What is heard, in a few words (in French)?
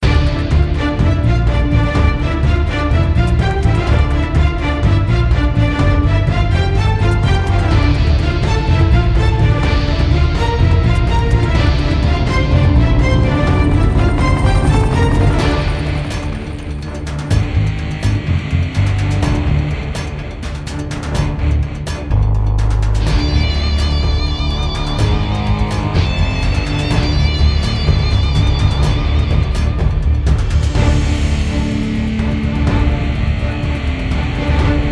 musique; fortoresse